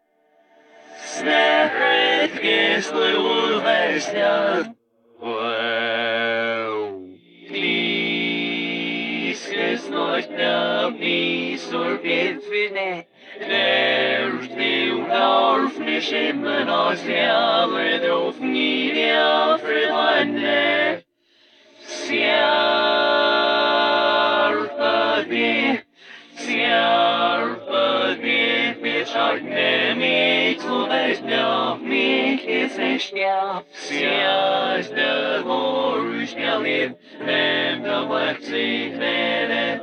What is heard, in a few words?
Vocal REVERSE; Don't Deal With Devil